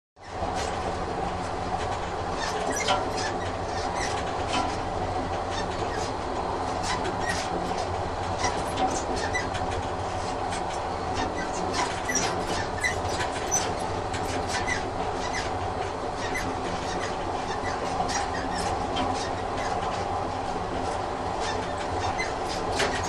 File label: Train Constant (Interior) Interior Diesel Train; Hollywood Edge 3-D SFx